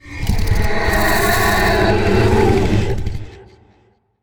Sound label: Vocal Idle; Warframe; Zariman; Void Angel